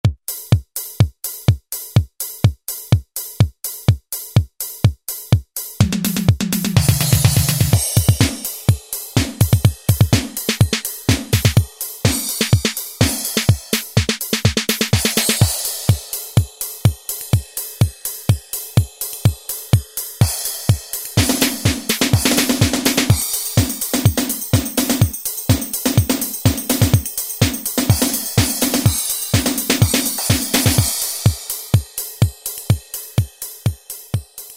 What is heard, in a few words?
Dance, Alesis SR-16